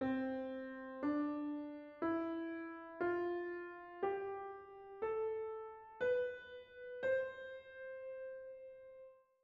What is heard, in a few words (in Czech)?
cdefgahc; Cerne klavesy